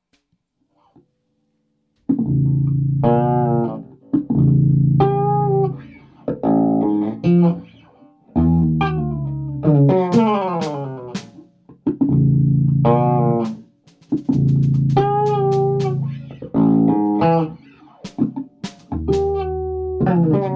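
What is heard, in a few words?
bass guitar solo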